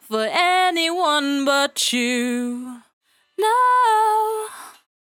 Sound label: 68bpm D, Velvet Vocal; Zero-G